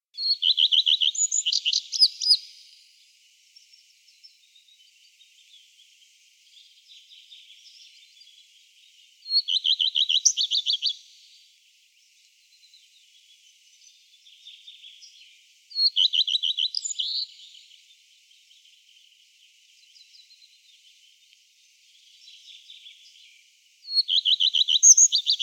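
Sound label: common redstart voice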